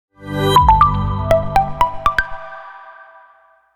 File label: splash